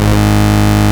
Bass, Root G#; Vengeance Samples

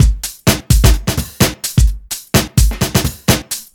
Housebeats, 128BPM; Vengeance Samples; VEH2 Loops

